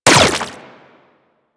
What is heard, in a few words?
Laser Fire; Moa Laser